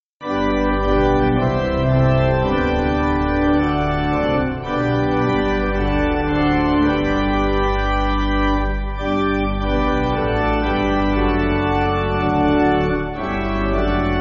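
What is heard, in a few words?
Pipe, Pipe Organ, Music Backing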